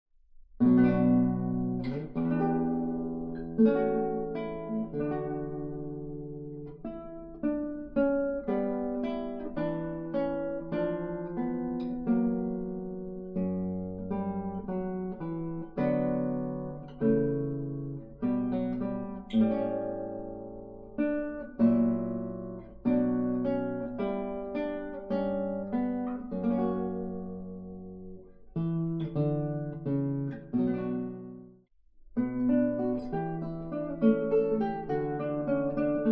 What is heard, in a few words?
sarabando